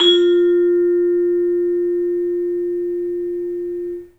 vibes; 3D Sound; 3D Keys VOL-1

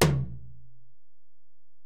Tom, Alesis DM-PRO, Drum Machines, Toms

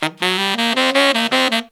160 BPM, Horns

